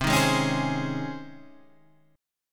C sharp Major 7th Suspended 2nd, C

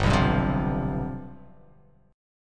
G 11th G, chord

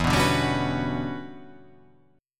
E Minor 13th chord

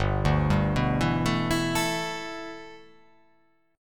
G 6th Add 9th G, chord

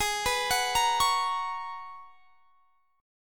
Ab Minor 11th, Ab; Abm11; arpeggiated; x,23,21,23,23,21

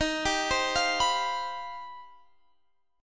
C Minor Major 11th

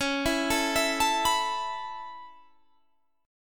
A Major Add 9th C sharp

